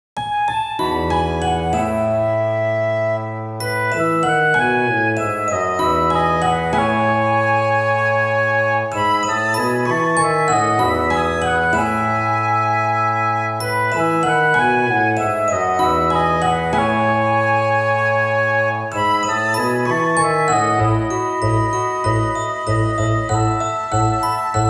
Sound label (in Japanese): ガーデニング